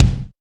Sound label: clicky kick single shot B key